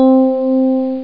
E Piano

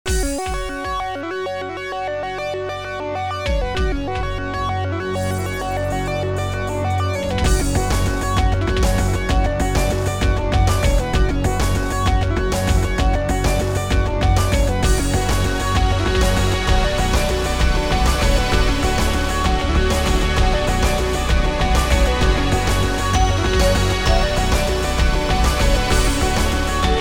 tune

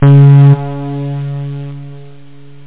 synth; da capo vol1; instruments

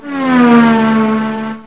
car pass; Enciclopedia Del Perro

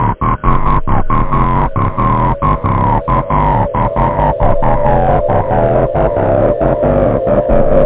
solar, loop, house; da capo vol1

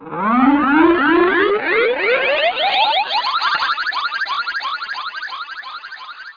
Swirl; Let's Discover Michigan!

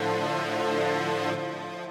SYNTHPAD, HOUSE, 125, A; eJay; Sphere

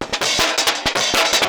DRUMLOOP D+B 160, Drum+Bass Loop; eJay

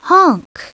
honk; Princess and the Pea; SoftKey

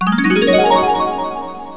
Power; wingames; senet

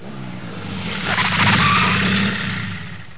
Suzuki; MISC VHCL

